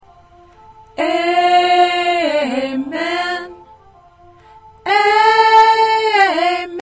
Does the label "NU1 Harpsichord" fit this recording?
No